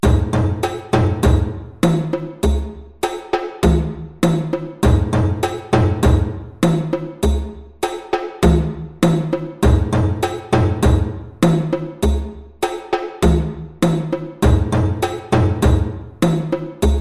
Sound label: Dymama Groove 100 bpm, djembe